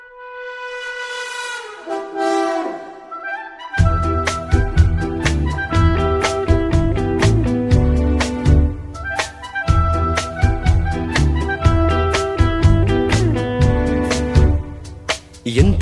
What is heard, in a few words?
Music Bit Ringtone